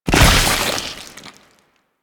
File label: Infested Bone Blade Mortar Fire; Warframe